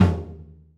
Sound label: low mid tom single hit f key; tom drum samples; one shots